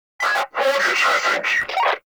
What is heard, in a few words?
Cloak Remove Vocal; Frumentarius